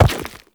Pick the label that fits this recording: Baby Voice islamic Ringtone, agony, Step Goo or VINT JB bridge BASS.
Step Goo